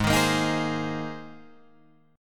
G sharp Major Add 9th, Guitar Drop D

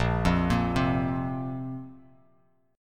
Bb Suspended 2nd Bb down; arpeggiated

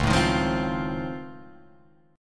Db Augmented Major 9th, down, Guitar, Open F; strummed; JGuitar

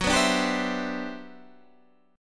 G Major 13th

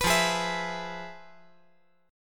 F Minor Major 7th Flat 5th

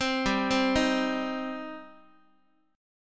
G Suspended 4th Sharp 5th, Guitar; Gsus4#5 arpeggiated; JGuitar

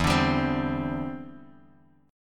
E Minor 7th Sharp 5th, down, Bass Standard; strummed

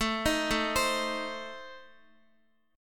A Diminished, down Guitar; Adim arpeggiated

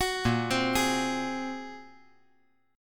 A sharp 7th Suspended 2nd Sharp 5th; A#7sus2#5 arpeggiated; JGuitar

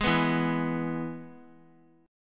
E Major chord; strummed; JGuitar

